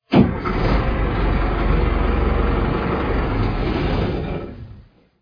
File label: diesel 180 hp